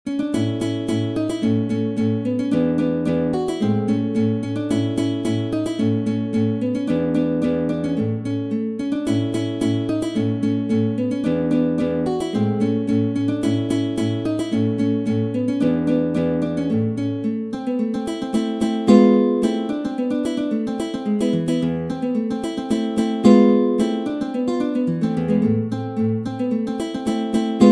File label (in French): Allegretto Grazioso, Méthode pour la guitare; Ferdinando Carulli; Op. 241; Classical guitar